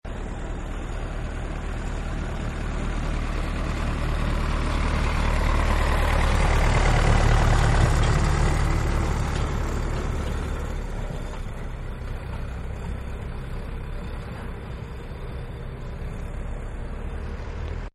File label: Tractor Driveby; Toy Sirens; Partners In Rhyme